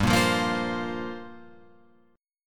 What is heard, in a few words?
G Minor 11th G down Guitar Open F; Gm11 strummed